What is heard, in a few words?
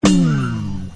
bass